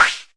Arturia MiniBrute sn

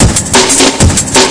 dirty nasty, drum loops and breaks; 181 bpm plus

